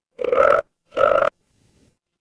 animal frog

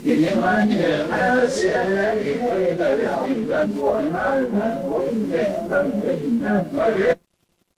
crowd murmur

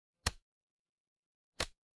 ui toggle

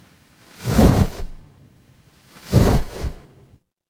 transition swoosh

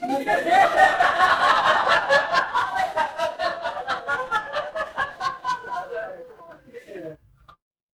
crowd laughter

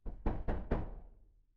door knock

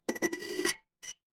glass ceramic

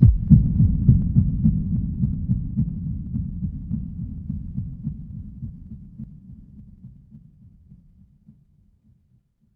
horror heartbeat

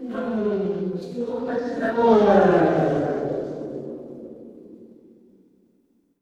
crowd murmur

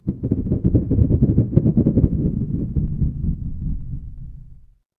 ambience thunder

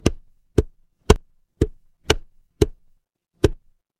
instrument wood block

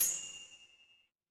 instrument chime bar

